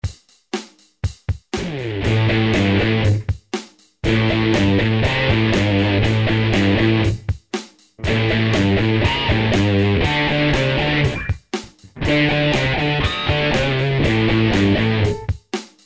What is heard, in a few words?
basic guitar